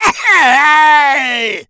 smoker spot prey

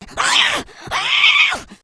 rage, infected, female; npc, action

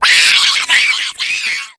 rage at victim, female

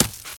gravel, footsteps infected walk; player

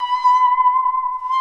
saw danger, music, zombat

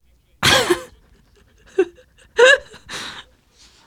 laughter, teen girl

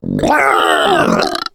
female spot prey; boomer; attack

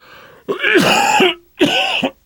cough, survivor voice; namvet; Left 4 Dead 2; left4dead2 dlc2

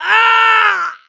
hurt critical, mechanic; voice

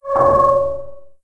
random metal squeak

